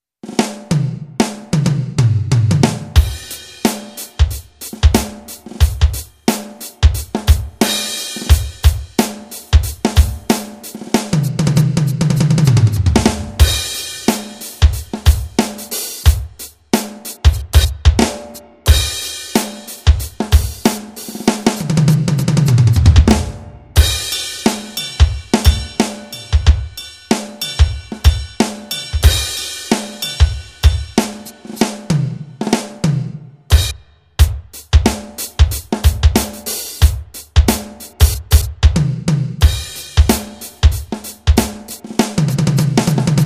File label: funk